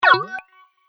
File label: Multimedia Digital Bleeps; Beep Sound Effects